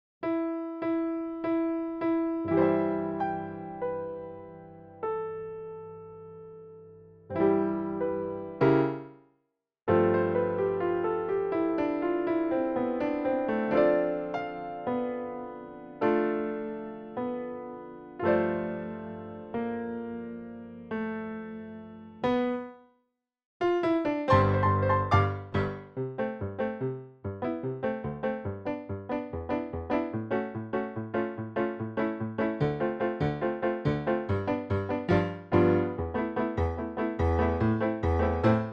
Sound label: Backing Track